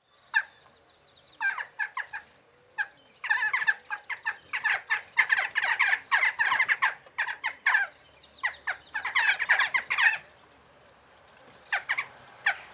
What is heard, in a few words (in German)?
Dohle Coloeus monedula